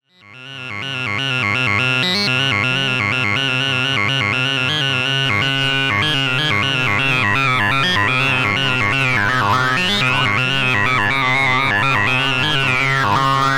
Xaoc Devices Leibniz Binary Subsystem Wavetable Bank Vocaloid